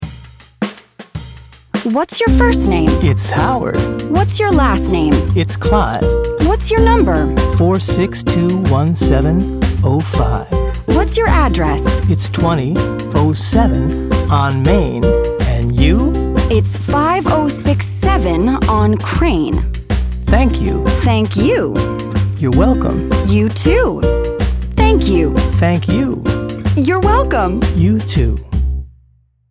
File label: Practi-Chants, What's Your Name; Connectivity Foundation